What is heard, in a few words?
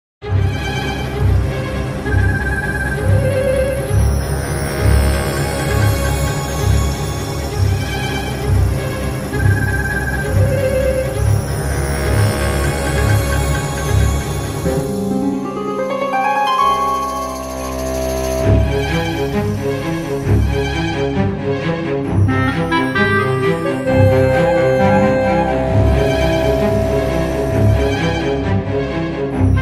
Creepy Fantasy Music - Insect Lair; TWBTW